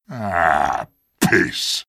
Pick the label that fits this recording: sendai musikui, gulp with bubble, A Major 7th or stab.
stab